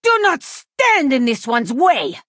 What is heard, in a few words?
burglar attack